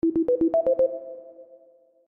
Modern Tone

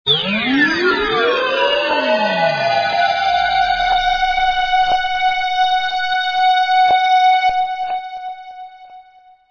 alien alert

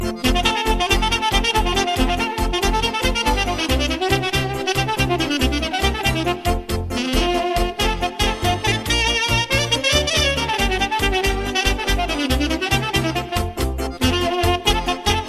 saxofon

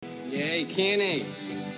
Yay; Kenny

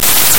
Shh

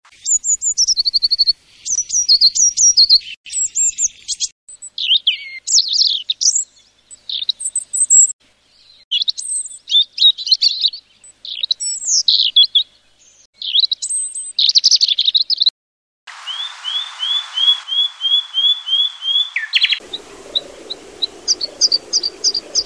Bird Sounds; Htc

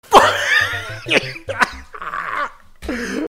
Wheezy Laugh; Dashie

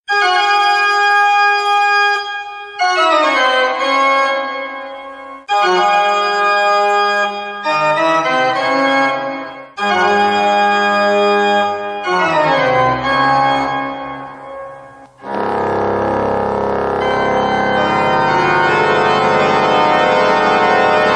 Halloween Organ

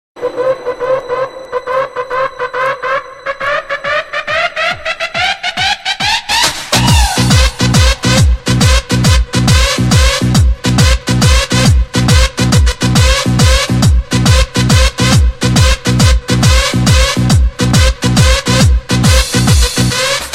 Techno

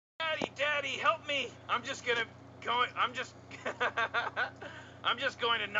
laughing

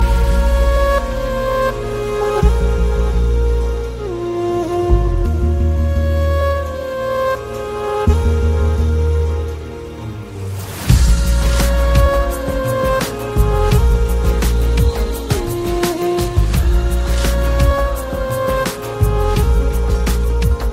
Ring Tone

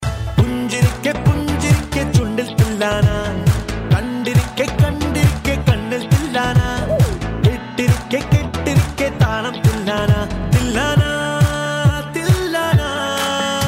Beats Dj Style

